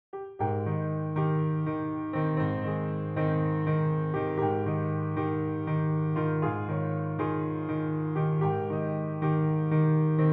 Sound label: piano